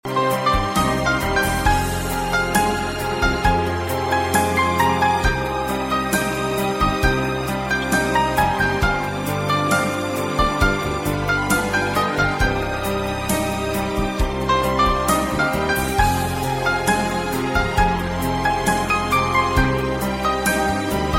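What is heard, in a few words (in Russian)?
krasivaya fortepiannaya muzika, beautiful piano music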